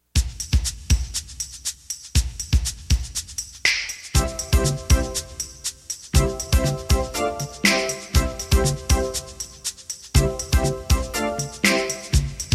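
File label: instrumental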